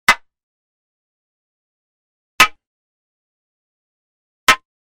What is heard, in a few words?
Staff Hit; Hollywood Edge Martial Arts and Human Impacts